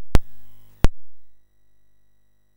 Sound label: Commodore 64 Christmas BASIC MOS6581R3